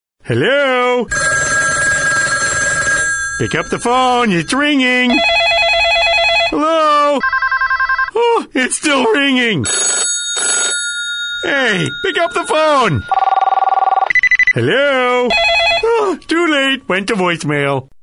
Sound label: Ringing; Homer